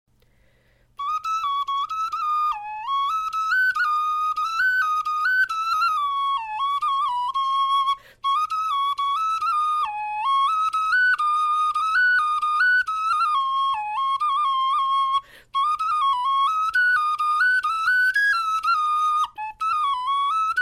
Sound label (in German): melodie